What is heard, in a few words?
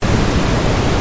song